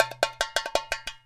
FILL R, Percussion; InVision Interactive; Percussion Slam

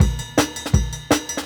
Jungle Breaks

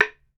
BAMBOO, World Assortment

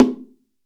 BONGO, STEREO BONGO, Cuba

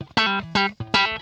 PLUK, Funk Guitar; 90 s Sample CDs; Zero G

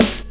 SD, Dance Mega Drums; Best Service; SD-HIP

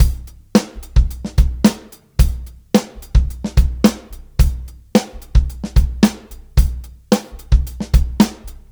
Live Beats, R&B Drums

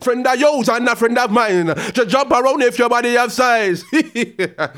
ragga in B phrase

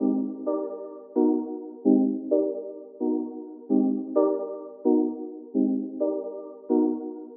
130 Cm synth, Synth Loops, Trance

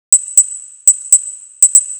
Percussion, Trance NRG, Drums

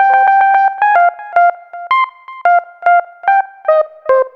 lead (plucky); Sonic Foundry (Sony Creative Software); Ugly Remnants VOL-2; Leads

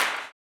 CLAP, blues & soul; ILIO; Vocal Planet

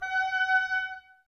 OBOES F#, Oboes, Wood Winds; Miroslav Vitous; Woodwind Brass Ensemble

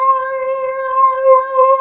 PHASE

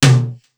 Simmons SDS2000; SDS2000 Factory6

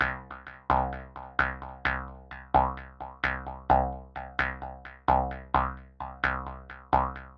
130 C bass, bass loops, trance; Trance Explosion Vol1

